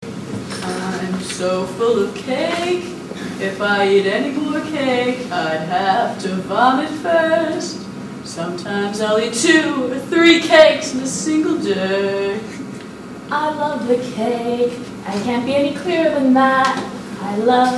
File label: cake duet; cake covers